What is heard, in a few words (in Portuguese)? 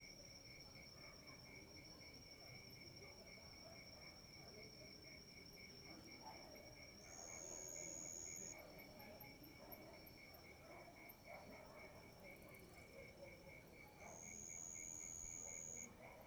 Ambiencia rural a noite com grilo, morcego, cachorros latindo e pessoas; longe